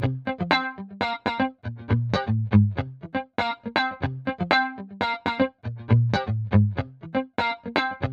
guitare cot; eastwest colossus

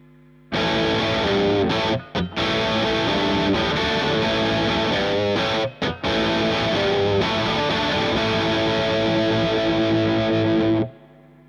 sat sans rien chevalet punch; bogner alchemist

